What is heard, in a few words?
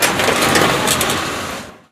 van inside scrape